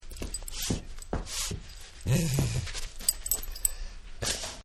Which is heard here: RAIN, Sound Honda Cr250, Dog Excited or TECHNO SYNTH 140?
Dog Excited